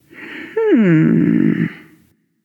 NV Thinking; smite; awilix

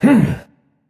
grunt jump; smite; hebo

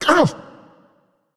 ouch; smite; thor

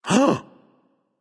nv breath, vox; smite; voicepack; vulcan

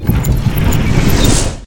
ball; smite; chronos

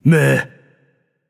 nv tongue; smite; voicepack; hachiman

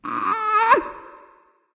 lift grunt; smite; awilix

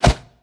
whoosh; smite; god raijin

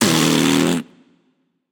nv tongue; smite; voicepack; hachiman

